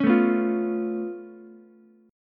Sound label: Db Augmented 7th Db down Ukulele; strummed; JGuitar